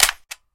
hunting rifle clip locked; weapons

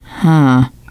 negative noise, teengirl; player; survivor; voice

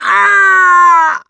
hurt critical, mechanic; voice

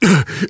choke; namvet; Left 4 Dead 2; left4dead2 dlc2

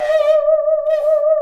saw danger, music, zombat

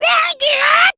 rage, infected, female

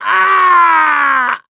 death scream, mechanic, survivor; voice

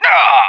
whack smash; moustachio

